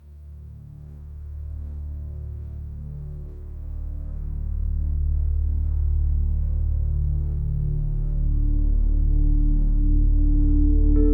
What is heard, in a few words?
calm